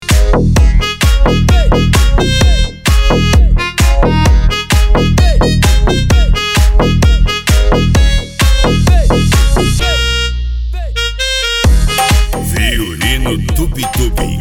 retro

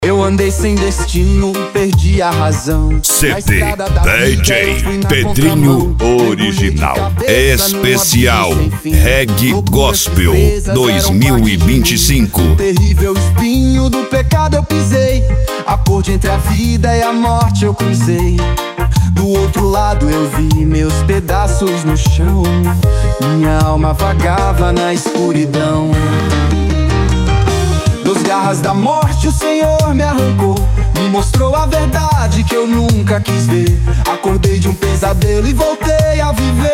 reggae gospel

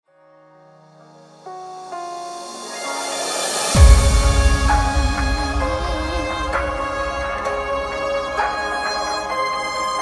remix gospel

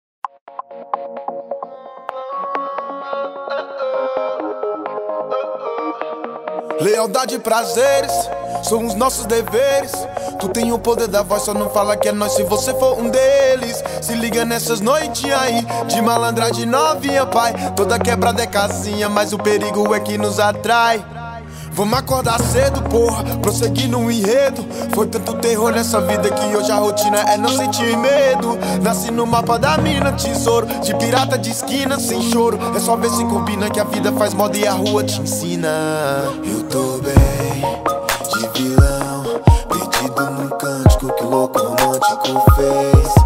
hip hop

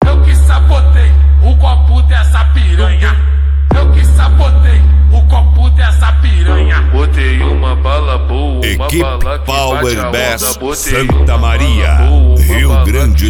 power bass

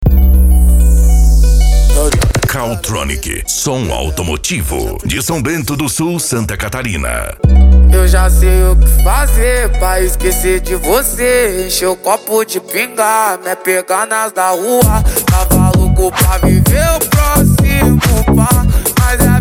mega funk e funk mega bass

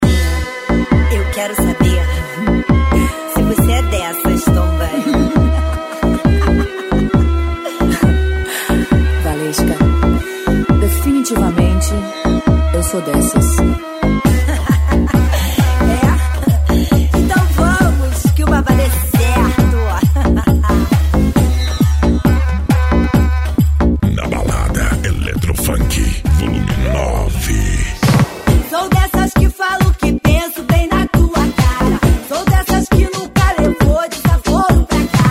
electro funk; na balada

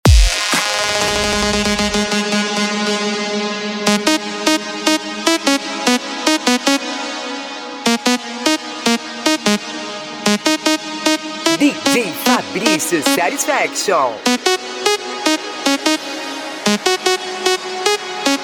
deep house

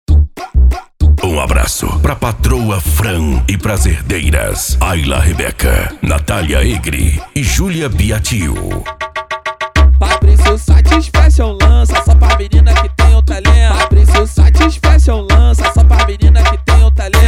funk mega bass

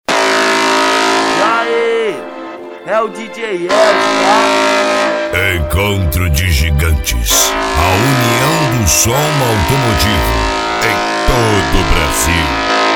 instrumental; cd encontro de gigantes sp

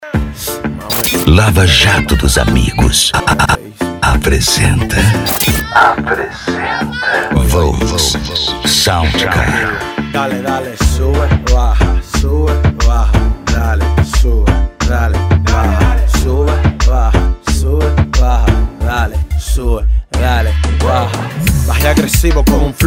sound car reggaeton; cd volks sound car

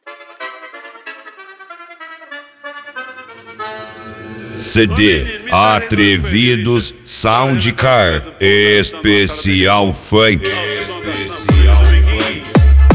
funk

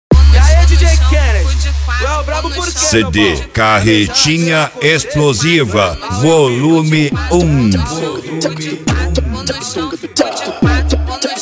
mega bass

